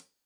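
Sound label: hat closed, hi-hat cymbals stereo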